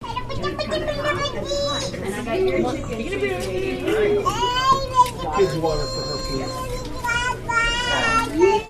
Baby Gibberish, My Little baby son speaking gibberish in a restaurant